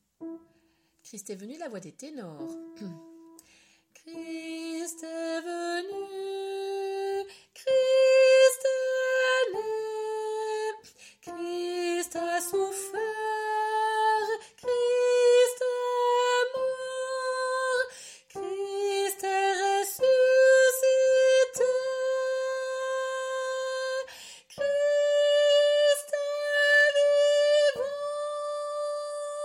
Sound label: Tenor